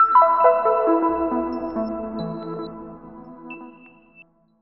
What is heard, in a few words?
DR. LOOP; X-Static Goldmine 3